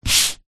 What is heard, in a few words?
paper sheet a4 push by hand on table; zapsplat